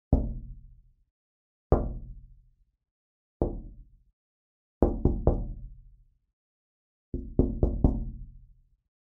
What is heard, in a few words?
knock on window